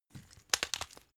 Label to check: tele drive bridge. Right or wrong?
Wrong